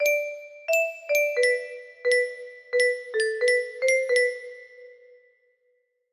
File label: music box beginning